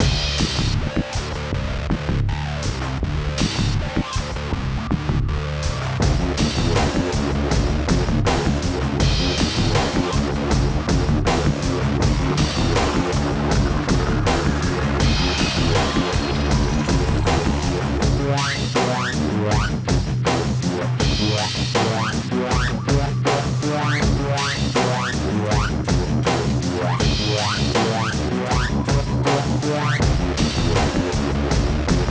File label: Bgm Battle